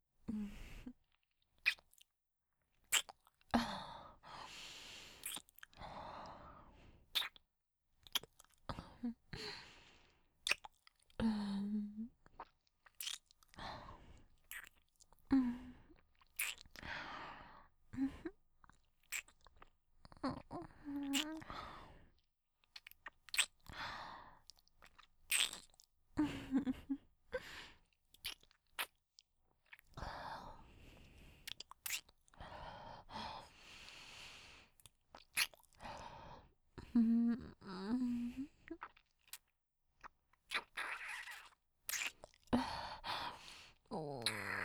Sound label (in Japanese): キス; マゾハーレム